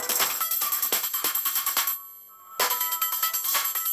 funny

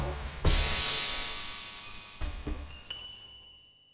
funny

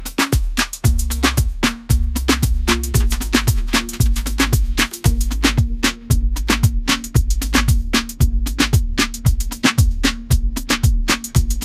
ringtone afrobeats

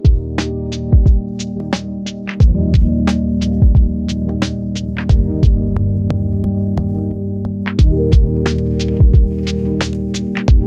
ringtone lofi

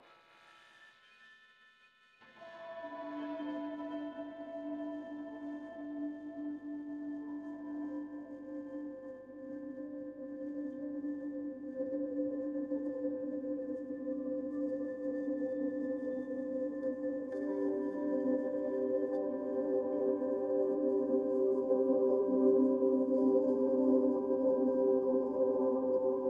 ambient nature

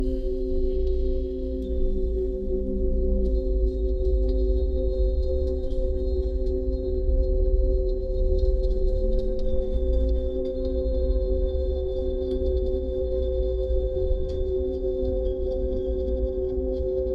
ambient meditation

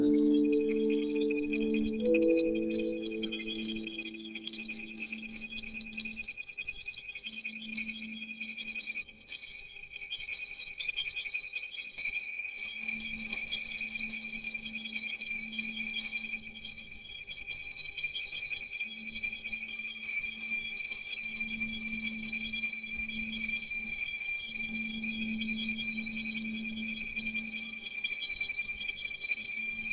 ambient nature

